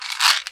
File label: Shaking Vitamin Bottle